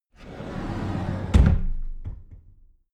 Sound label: cupboard slide door close regular